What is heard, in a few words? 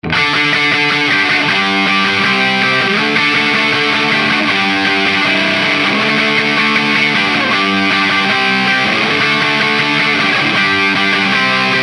Metalic Shrine